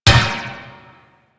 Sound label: Ball Bounce Metal; Warframe; Missions; Tenno Ball